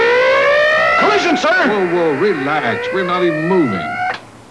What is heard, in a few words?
Collision; operation